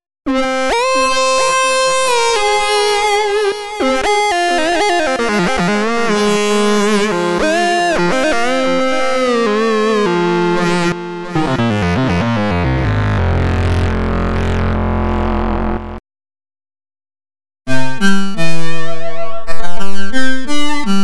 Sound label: synth